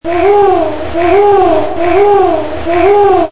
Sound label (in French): grand duc